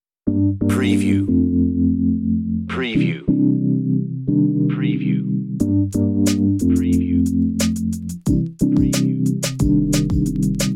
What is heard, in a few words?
Beach, on-hold music